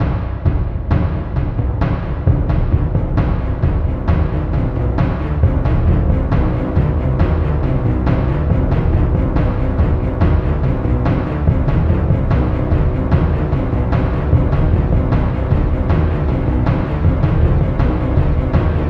Before the battle Envelope Drums; Real Warfare 2 Northern Crusades

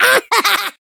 Zombie Laugh; Boss Zombie

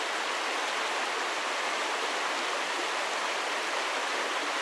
water

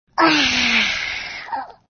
yawning; baby

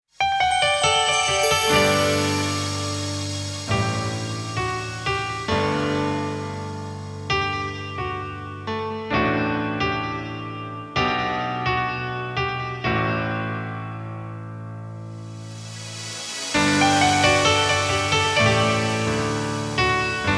water drop; Tekken 2 Strike Fighting Vol.1

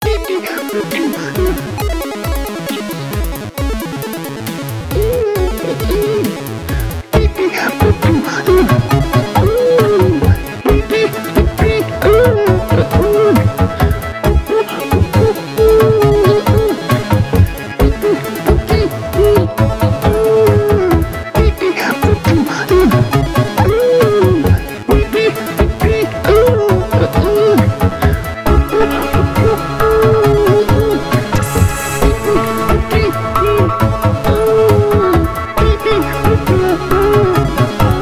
Chiptune; New Scuffed Epic Soundtrack Vol. 2

